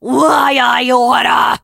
hurt vo

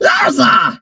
hurt vo; Cordelius

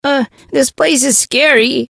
hurt vo

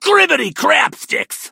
hurt; clancy

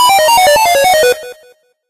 8 bit die vo

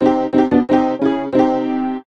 8bit piano die

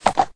ram step

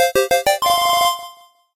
8bit lead

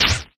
dryfire

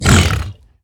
hoglin hurt, 疣猪兽：受伤; Minecraft; 我的世界; 疣猪兽是一种生成在绯红森林的攻击型生物。它们是目前唯一可繁殖的攻击型生物。

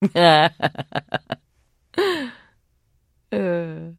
trader laugh; 七日杀; 商人杰恩

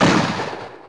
cannon

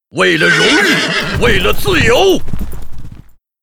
warcry; Knight; 人类

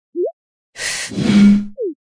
Musket Musketeer

